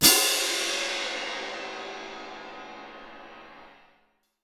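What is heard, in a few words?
CYM MF right, cymbal, percussion; Miroslav Vitous - Percussion & Harp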